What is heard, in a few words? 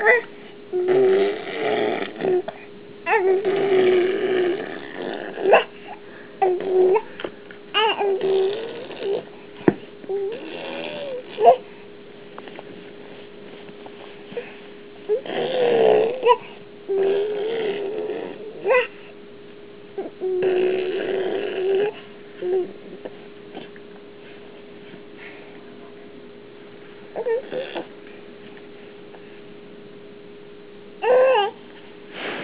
bubbling